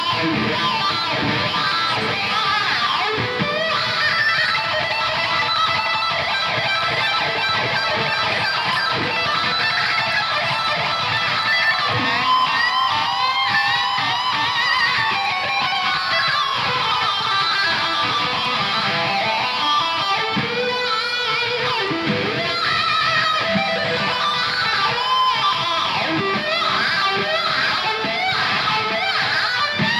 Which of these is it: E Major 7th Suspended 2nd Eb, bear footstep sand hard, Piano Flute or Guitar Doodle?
Guitar Doodle